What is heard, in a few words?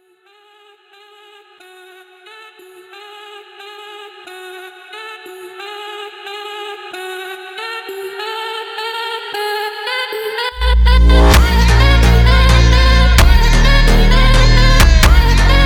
phonk funk